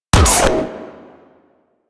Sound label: Harpoon Gun Charged Shot; Warframe; Sharkwing; Grineer